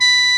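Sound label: sheng A#3; AKAI S6000 CD-ROM - Volume 1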